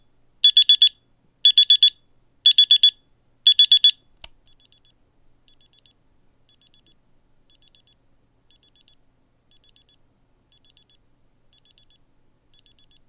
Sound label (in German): Timer Dual laut leise